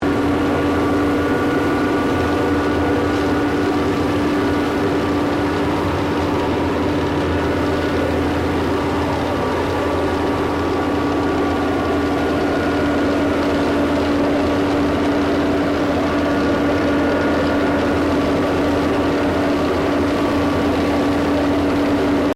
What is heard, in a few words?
Streetsweeper Small Operating Rear Loop, Street cleaning vehicle